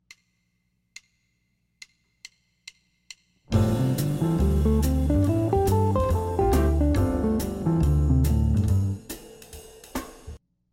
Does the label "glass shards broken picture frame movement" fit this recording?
No